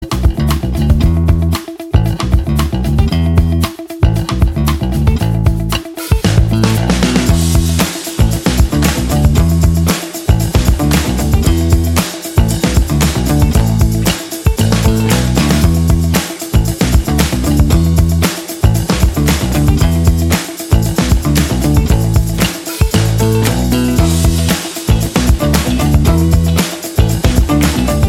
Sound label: Upbeat Pop Funk; MIUI12